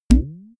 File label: boing